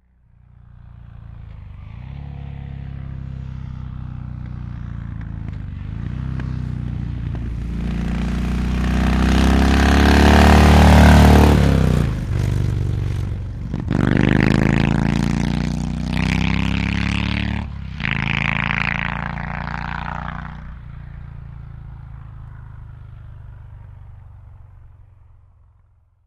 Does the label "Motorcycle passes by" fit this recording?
Yes